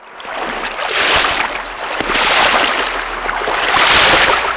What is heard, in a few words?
wave